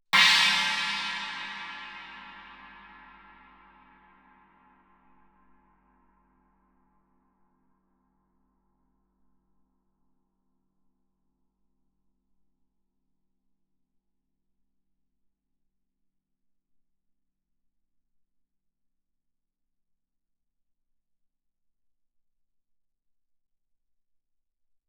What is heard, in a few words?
chinese stick shoulder ff, suspended cymbals; Percussion